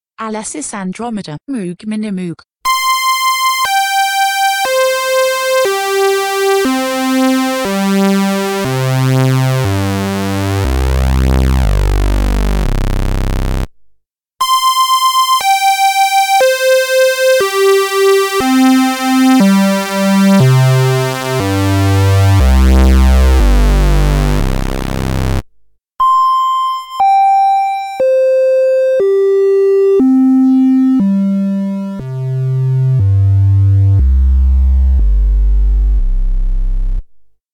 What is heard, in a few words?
A6 Minimoog Raw Waveforms